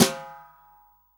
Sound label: SWING SNARE CHROMATIC, Real Drum Kit; REAL DRUMS